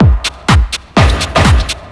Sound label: DRM 125, House